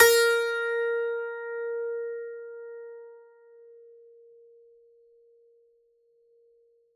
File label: as3 Pick, strings